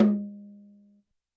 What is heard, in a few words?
Tom Brush, YAMAHA RM1X